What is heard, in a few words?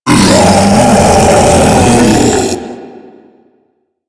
Infested Ancient Taunt Scream; Enemies; Warframe